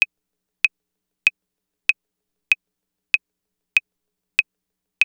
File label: TR-808 Claves; Roland; Instrument samples